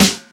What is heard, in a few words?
high quality shiny snare sound g key, snare one shot tuned to the G note; snare drum samples